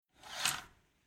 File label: Domestic Window Curtains Wooden Rail Closed Quickly